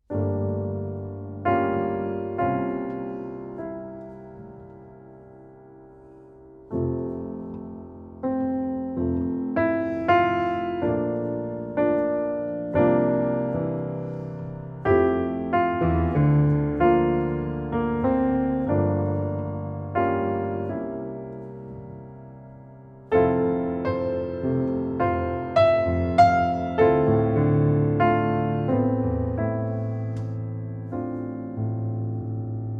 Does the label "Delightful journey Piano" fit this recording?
Yes